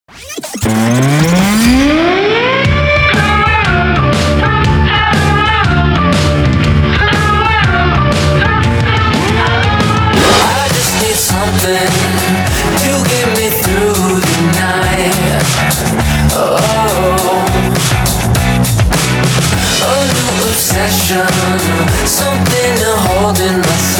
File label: Indie Rock Mix; cars